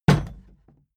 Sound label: plastic box big kick rattle; soundpool